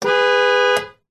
vehicles car horn short honk interior nissan patrol